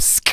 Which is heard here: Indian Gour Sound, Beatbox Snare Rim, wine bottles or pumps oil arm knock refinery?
Beatbox Snare Rim